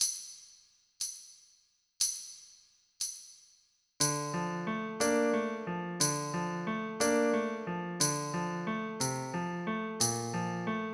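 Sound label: Study Slow 60, Pianoforte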